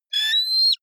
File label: offspring eagle call, voegel; natur tiere